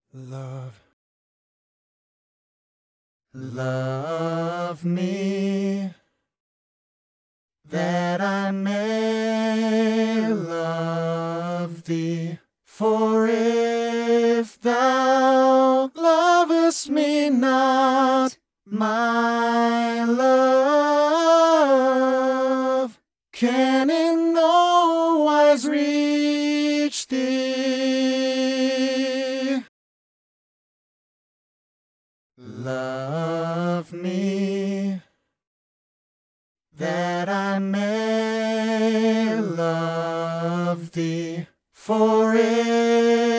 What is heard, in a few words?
love me, tenor, Baha'i Choral Music